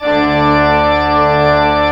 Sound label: MANUAL D2, Pipe Organ; E-MU Producer Series Vol. 2 – More Studio Essentials; Keyboards